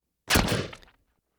comic weapon blast liquid burst squirt drip

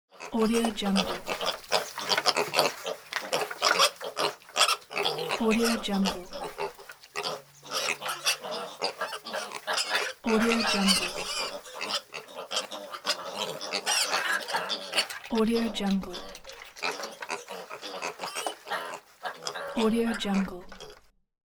pigs

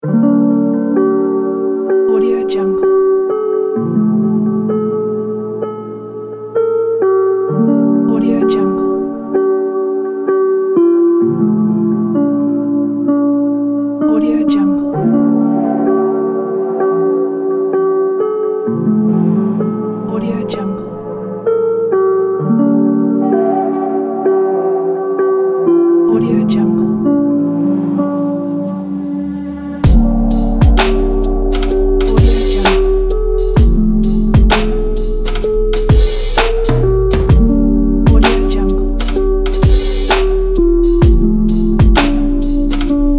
late night dreams hip hop

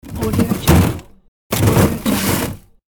freezer plastic box shelf open and close

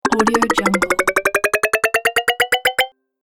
health recharge boost

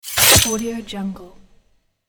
sword whoosh; konstantin 31313

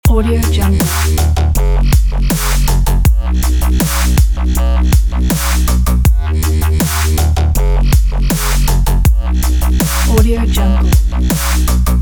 background dubstep loop

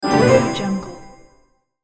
quiz correct

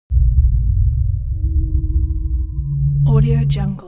atmo sci fi digital idle smooth

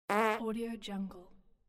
farting

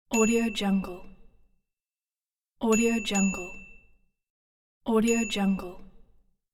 bicycle bell

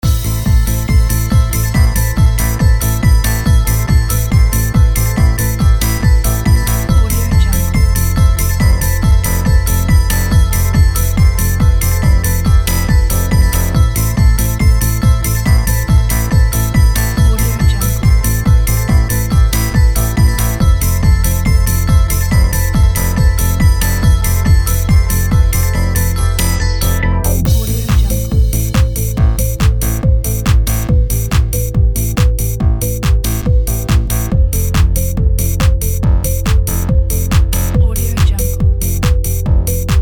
special news

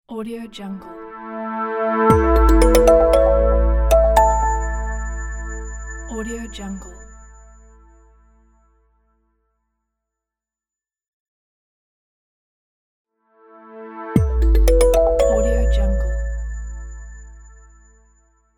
simply logo

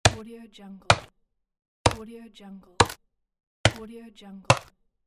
chopping wood

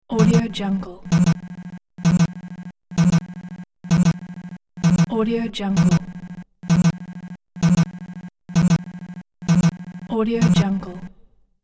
repeating robotic data movement

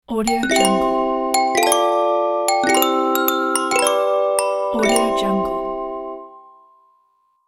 children logo

